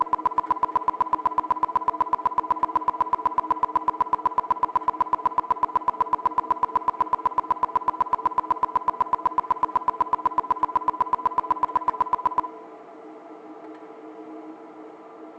burst 16ms 1kHz; Mic2 driver; CESI; LaSpezia

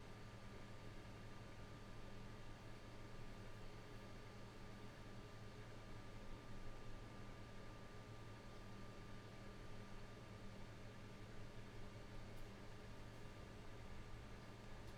Noise

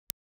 Source Calibration 2m freefield Lw=110dB; IMP-RESP; Musikverein

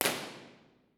Dodec IR, Teatro Municipale Piacenza; Dodec IR B&K2250

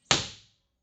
IR

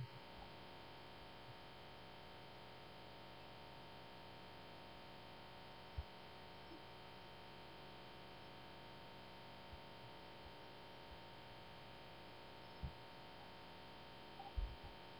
Background noise

